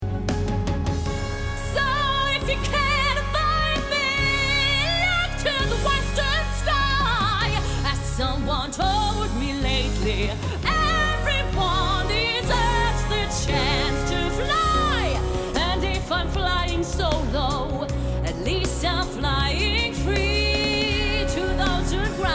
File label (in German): musical gesang; LOFT VOICES